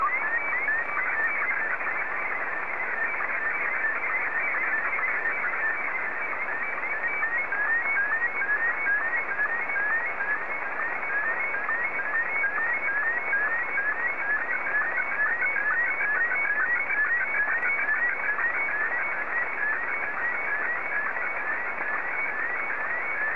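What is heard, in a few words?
Martin 1, slow-scan television; JA3QJQ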